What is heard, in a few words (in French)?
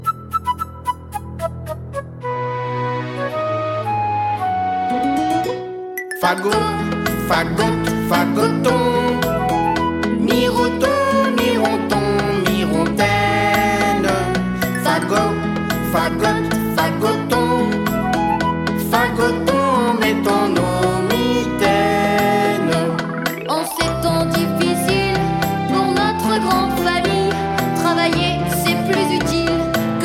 Chanson